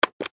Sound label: Button Switch; Multimedia Web Interactive; Buttons (Sound Effects:Multimedia